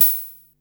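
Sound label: hi-hat synth open, Yamaha Motif ES drum machine; 300 Drum Machines; Yamaha Motif ES-Series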